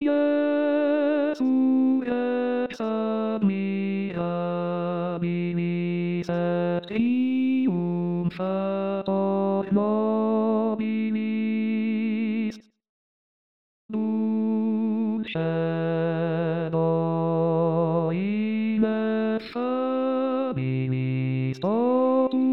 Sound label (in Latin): Jesu Rex Admirabilis Tenori; anno liturgico; Palestrina